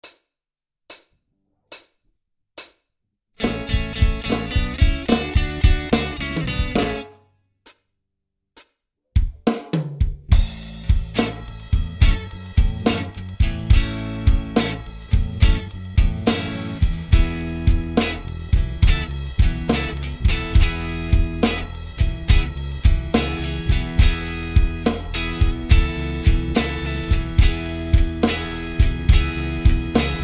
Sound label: solo backing